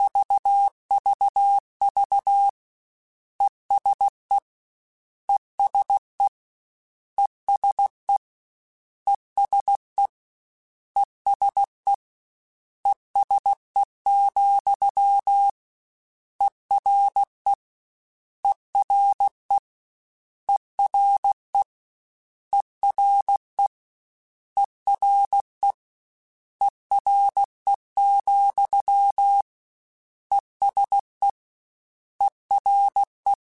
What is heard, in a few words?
CW Code Groups Suffixes, ESE ERE